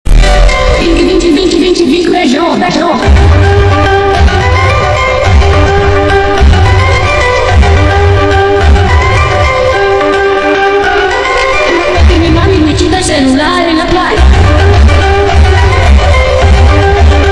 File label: FUNK bassboost